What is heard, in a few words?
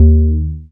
BAS FM DX-7